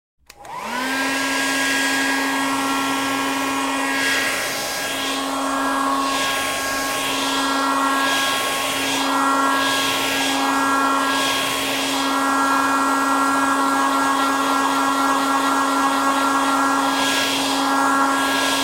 Blow Dryer Turn on & Dry Hair on High Speed & Turn Off, Household